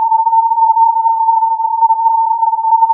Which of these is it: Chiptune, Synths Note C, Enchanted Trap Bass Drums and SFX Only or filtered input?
filtered input